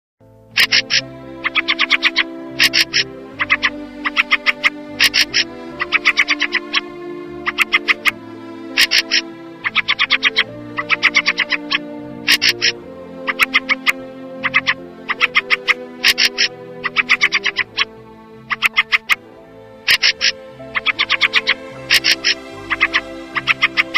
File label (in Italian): UCCELLO; A tartufi Guardando il cielo